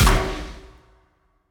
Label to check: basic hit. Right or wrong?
Right